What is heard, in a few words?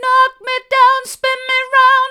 knock me down spin me round mid, vocal